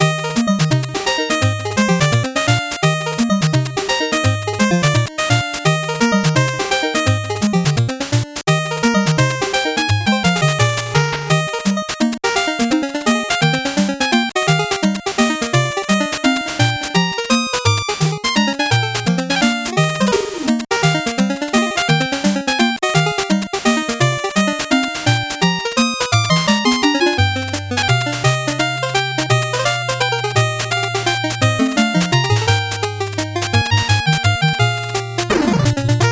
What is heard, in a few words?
8bit bgm fast